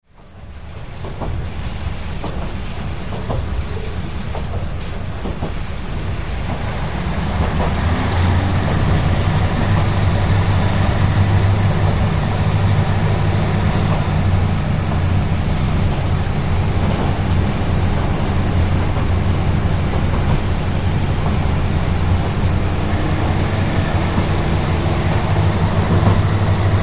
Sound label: kiha55; meisyou